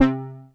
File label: Deep Bass c3, Korg EX-800